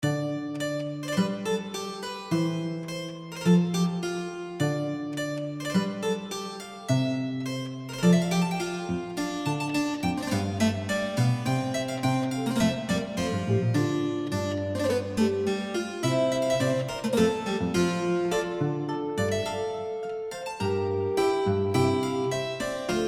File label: Explore Music Loop; fat princess adventures; ps4